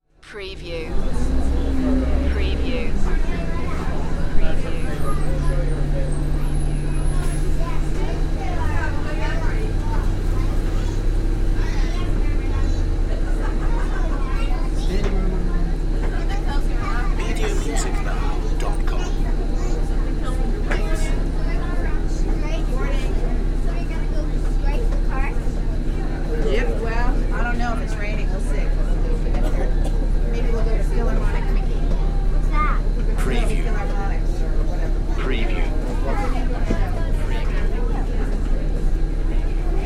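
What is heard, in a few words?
Inside Bus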